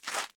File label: Footstep gravel